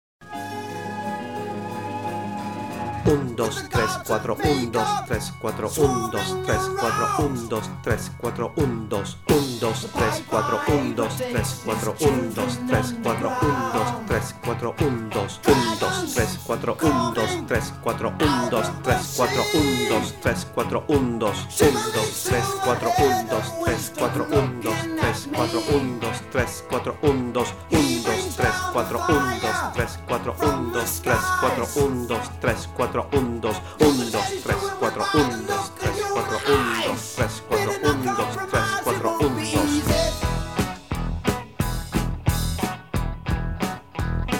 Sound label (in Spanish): solo de organo CON voiceover; Apocalipse